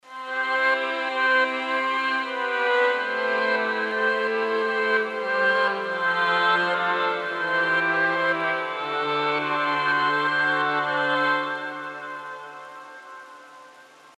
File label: Tape MELLO FI Grainy Hi Pass; Arturia Augmented Voices